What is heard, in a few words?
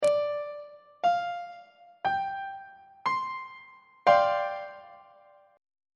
f double sharp dominant 7th suspended 4th 2nd inversion on treble clef